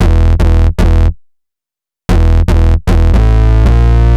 HipHop Synthbass Emaj, Hip Hop Bass Synthbass loop; Digidesign; Pro Tools Essential Loops